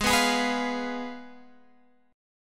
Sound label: Ab Minor 6th, Guitar, Open G; 18,16,18,16,18,x; down